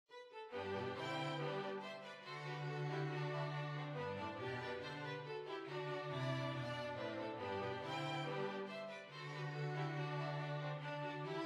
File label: Hornpipe